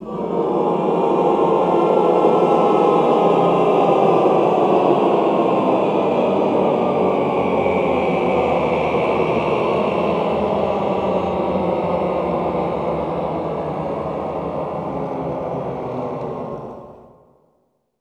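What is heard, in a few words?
Cluster Glissando Down, Classical Choir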